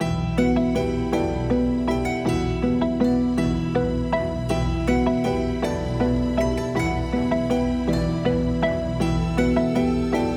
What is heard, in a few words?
rpg ambience exploration